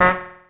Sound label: honk